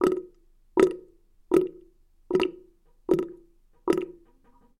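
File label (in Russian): вода спускание короткий монотонный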